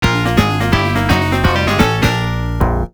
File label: Game Over; Toy Story (Genesis) (gamerip) (1995)